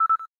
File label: cam timer last; LG; G4-Beat